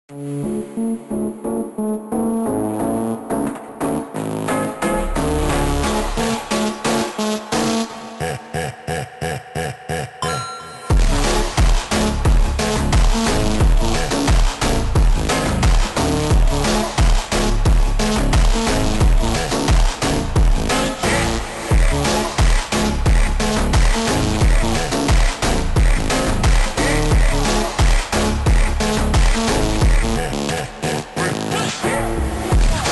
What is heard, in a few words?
ultra slowed reverb